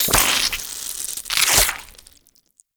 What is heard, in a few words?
Alchemy Lab UI Cyst Remove; Liset